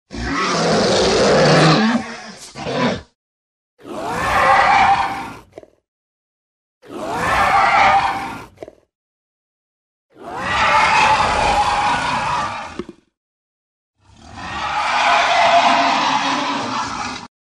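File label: monster sound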